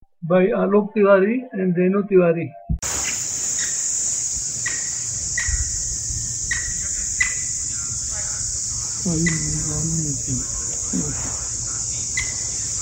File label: Greater Yellownape; Alok